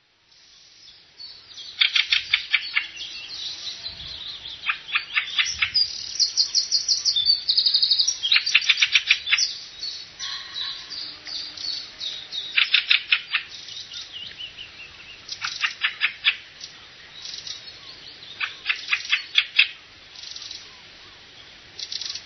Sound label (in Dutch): kramsvogel